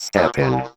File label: VOC, 160 BPM, Drum & Bass; Ueberschall; Drum & Bass Flash Trackz